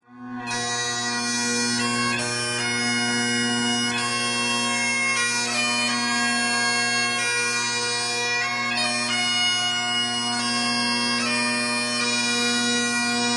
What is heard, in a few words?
bagpiper, tune samples